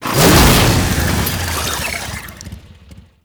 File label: Infested Hammer Whoosh, Weapons